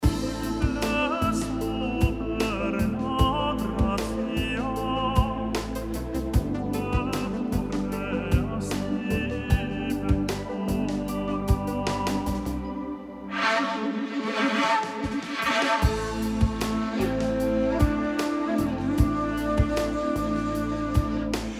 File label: roughmix with shakuhachi